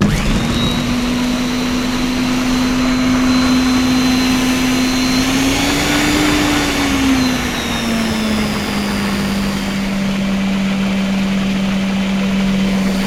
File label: blender; Golden Crayon Sounds